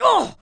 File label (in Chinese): 男 受伤